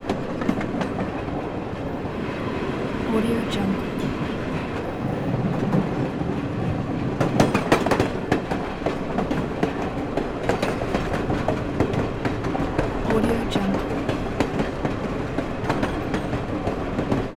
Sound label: train on bridge interior